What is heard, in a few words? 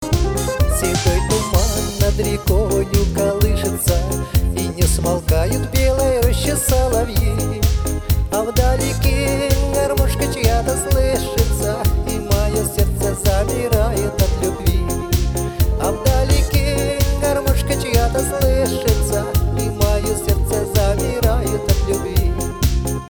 garmoshka